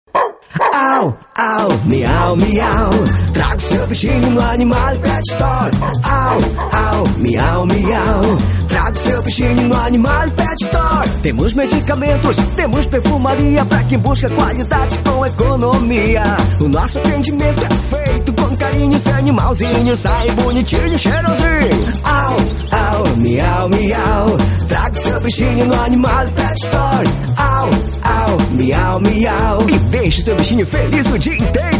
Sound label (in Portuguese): jingle pet, Jingle comercial